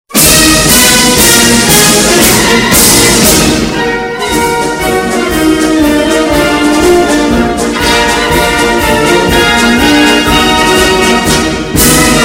loud notification

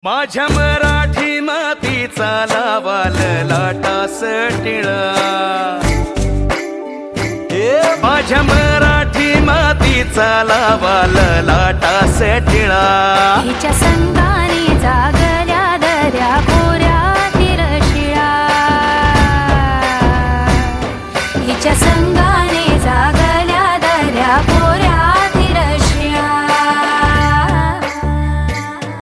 marathi tila tone

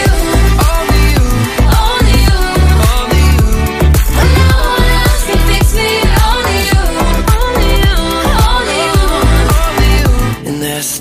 hot electro

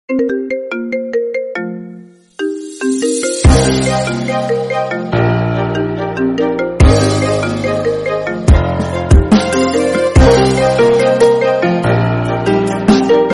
nokia progressive remix

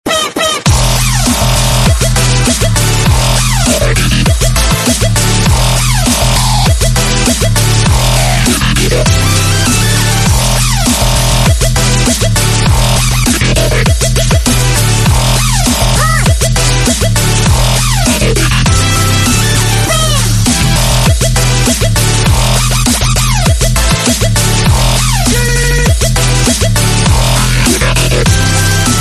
funny, ringtone; far cry

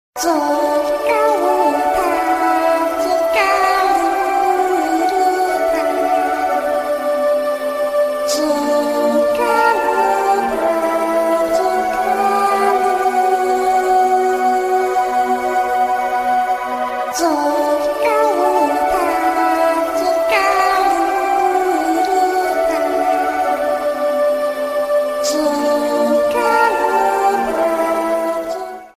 funny iphone new

